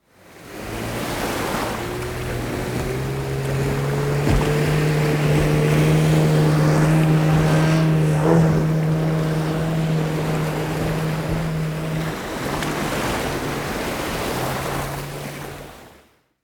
Jet Ski, watersport natuur; KMP Film Video Sound Effects

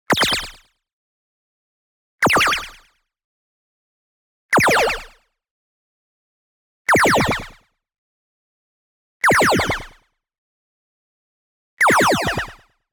Confirm Short; ZAP; boom library; Anime Essentials; DSGNMisc

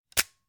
Shot Gun Fire Dry; Blind Survivor

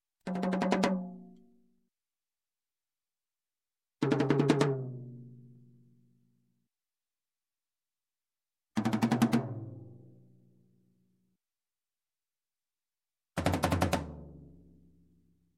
Drums, Tom Tom roll, Music, Percussion; Sound Ideas; Series 2000

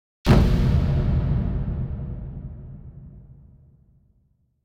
Impact Abstract Rap; Hollywood Edge; sound designer's toolkit v2

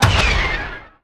Menu Scifi

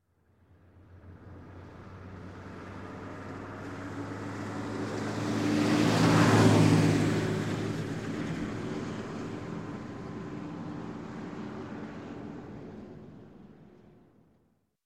Diesel Truck, Pass By, Medium; Sound Ideas 2000 Series

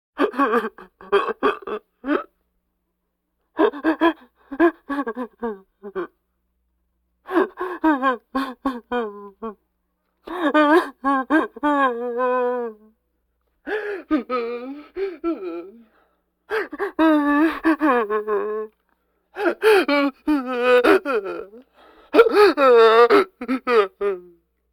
Vocal effects breathing Moaning Baby

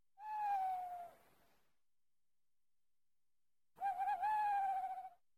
Owl; Transylvania; 1001 Sound Effects; Halloween Horror Sounds Effects

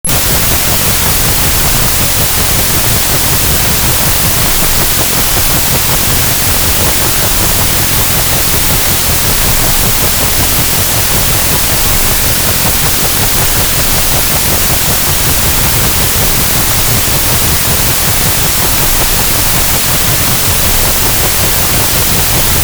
Rescue Helicopter; 1001 Sound Effects; Foley

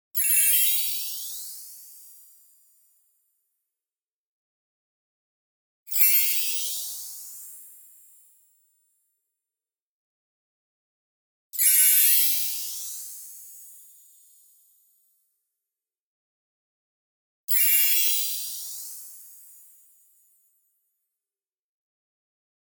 BLING Bell Tree Freq Shift; boom library; Anime Essentials

